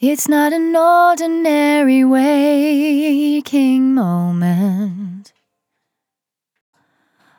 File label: On A Star 65bpm F, Vocal; Zero-G